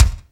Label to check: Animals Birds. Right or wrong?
Wrong